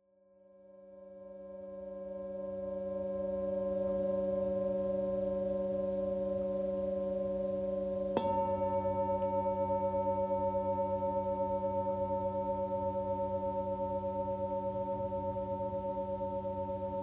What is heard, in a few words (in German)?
Klangschalen